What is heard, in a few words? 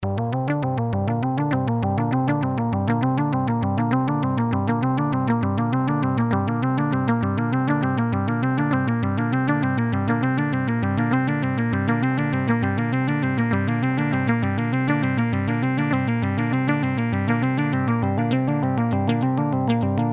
arp trippy 100; Oxium